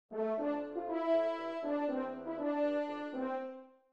Ring Leitmotiv, mid

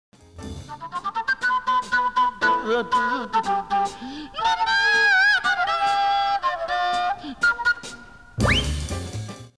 flute

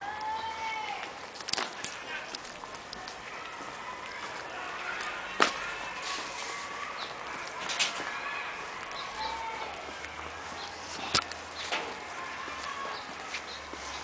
Nishinari Park

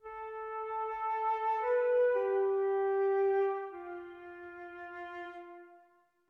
Horncomposer sad; Horncomposer lesson 1b

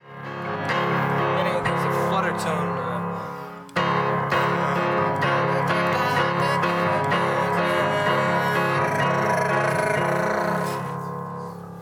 Piano

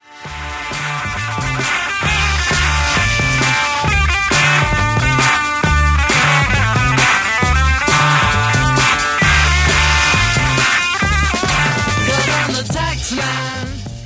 The guitar solo

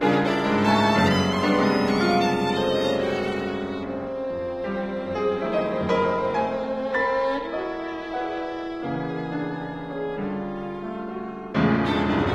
Violin Sonata; Ornstein

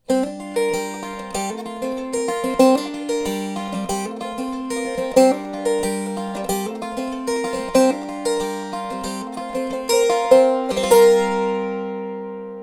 Hammer on guitalin

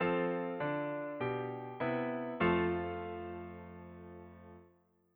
Cadence relative

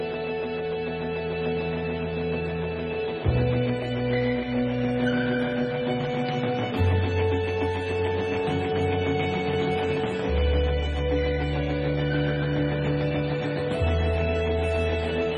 organ

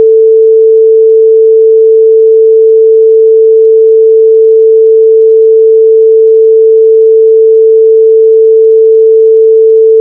Sinewave 444 Hz

